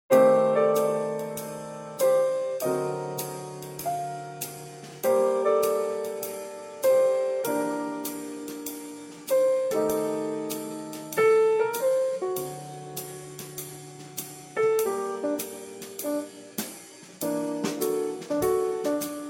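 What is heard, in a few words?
Minor ii V piano drums slow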